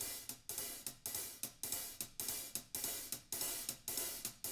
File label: HIHAT LOOPS; Living Drums